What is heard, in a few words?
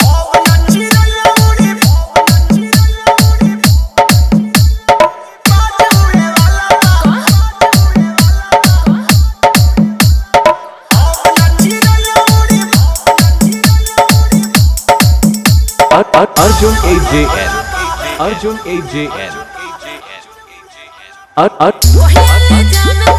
Dj Hard Bass Mixx, Bhojpuri Dj Song